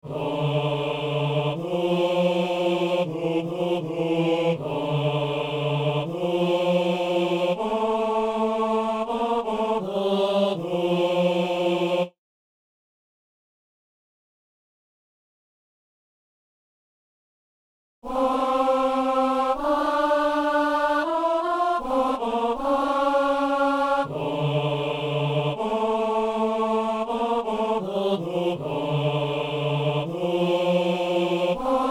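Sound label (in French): ténor; messe inaugurale; kyrie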